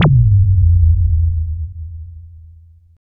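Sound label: FX left, attacks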